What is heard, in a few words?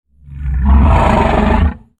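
ATTACK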